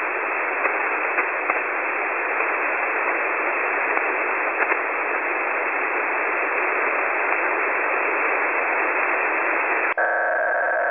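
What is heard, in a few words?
audio frequency-shift keying 400-960; 10210khz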